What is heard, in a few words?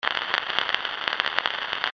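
Old Gramophone; Gramaphone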